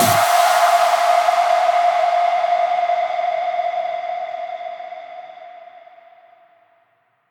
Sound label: Downsweeps